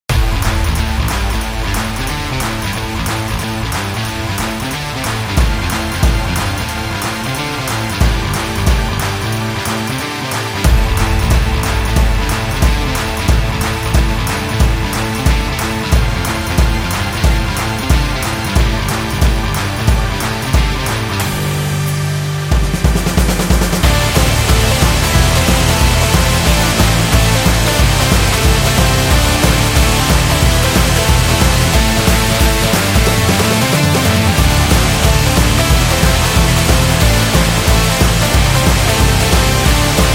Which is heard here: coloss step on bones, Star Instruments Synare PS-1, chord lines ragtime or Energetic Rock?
Energetic Rock